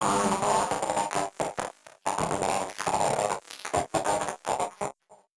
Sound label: Battered Synth; malfunctioning synth samples